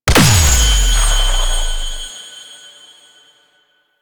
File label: Weak Point Destroyed; Enemies; Abilities; Warframe; Teralyst